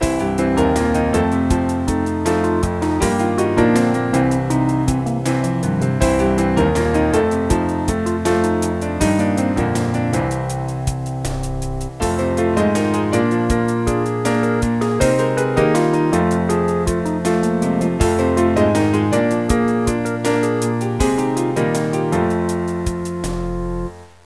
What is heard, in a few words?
PIANO